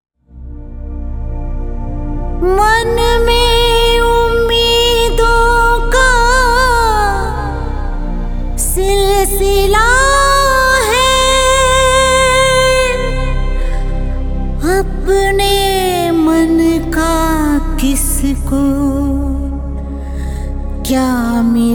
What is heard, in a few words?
Sad Version